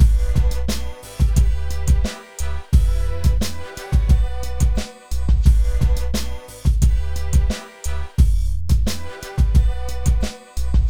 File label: basse